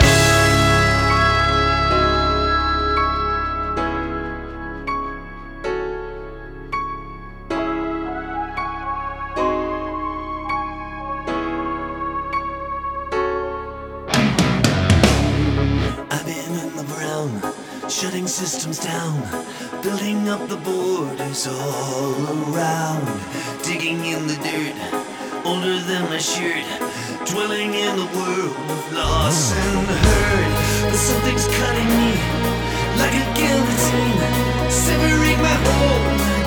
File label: Dark Melody